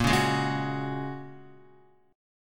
A sharp Minor 7th Sharp 5th A sharp, Guitar 4F,4A,5C,5G,5C,6E; strummed; x,1,1,1,1,2